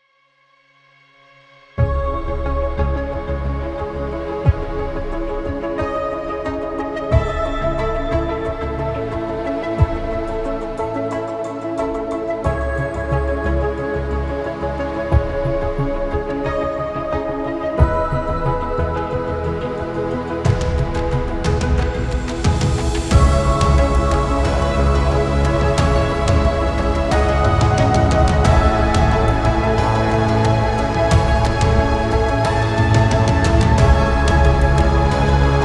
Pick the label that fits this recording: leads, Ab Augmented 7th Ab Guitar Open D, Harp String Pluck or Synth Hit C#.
leads